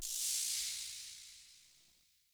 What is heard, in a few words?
Ship Descent Elec FX; Ships